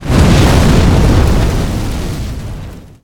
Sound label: weapon activate